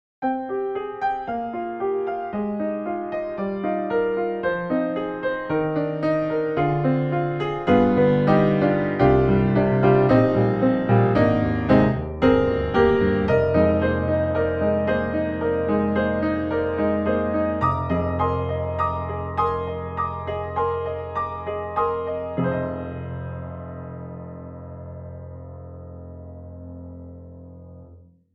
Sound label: Virtual Grand Piano Classical; Art Vista; Virtual Grand Piano 3